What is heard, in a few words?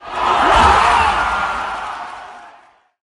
Score Applause; Doodle Jump 2